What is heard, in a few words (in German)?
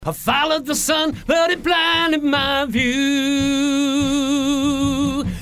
VA Tonhöhe quantisiert